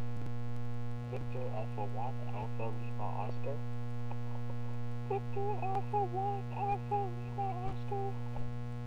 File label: smoke detector transmitter; va1alo mk2